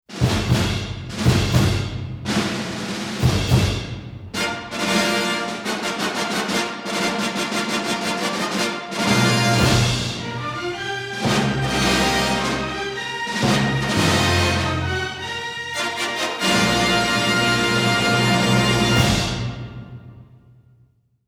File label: Fanfare SAD